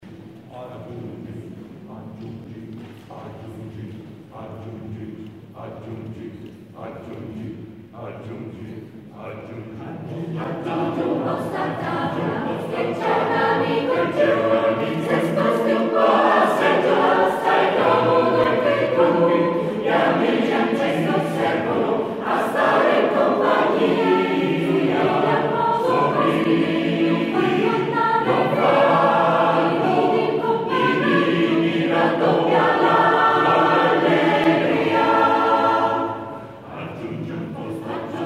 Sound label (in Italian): Coro Polifonico; Santarosa